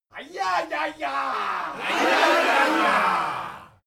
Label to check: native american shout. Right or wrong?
Right